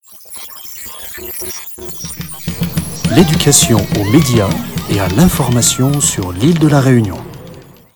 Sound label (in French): jingle; EMI